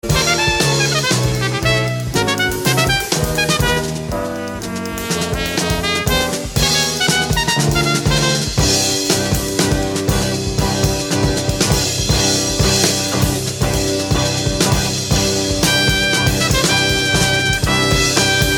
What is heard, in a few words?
Fanfare